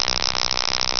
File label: STATIC HI